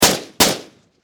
Warfare Machine Gun 5.6 Caliber Sig Sg 551 Double Shot Distant Perspective